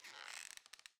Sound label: ambience room lair work layer